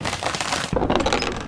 Treasure Chest Open; SFX Kit

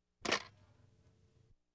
Household Phones

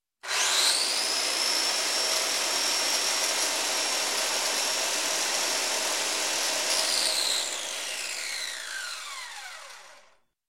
Construction, Saw Electric Saw Start, Run, Stop; Sound Ideas 2000 Series

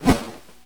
Swish Swing Baseball Bat

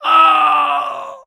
Player Death; AudioWizards

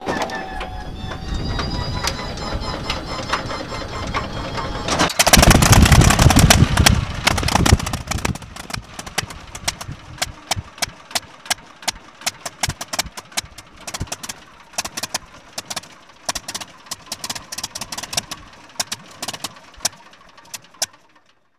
Plane P40 Start Stall; Airplanes

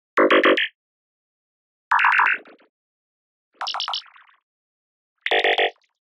Digital Click Neutral

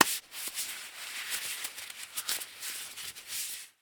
Latex Gloves Rub; Medical; Blastwave

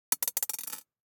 DICE on Glass, Throw and Roll, Mini, 1 One Die; Samples; ArticulatedSounds; DICE Sfx Party (Extended)

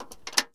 Plane P40 Lever Cowl Flap; Airplanes

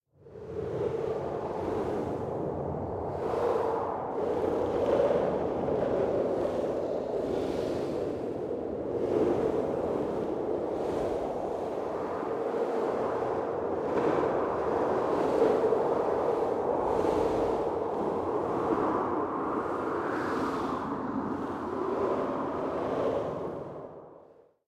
Wind Cold Ambience - Heavy Blizzard Whipping Wind; Hollywood Edge; sound designer's toolkit v2

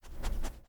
dog idle; Apotheorasis

